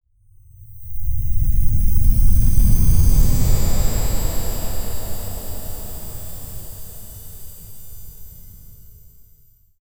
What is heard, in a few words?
liquid